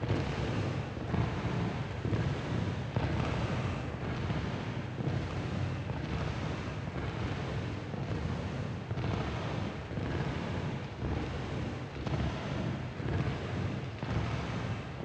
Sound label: marching